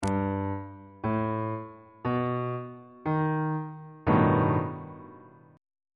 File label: a double sharp augmented 7th 2nd inversion on bass clef